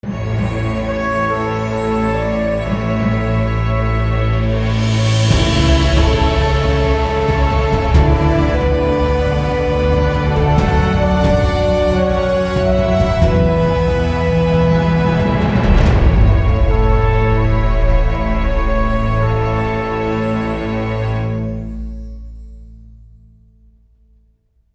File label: 10 Bars of Epic Sci Fi